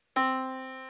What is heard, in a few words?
Yamaha Disk, piano sound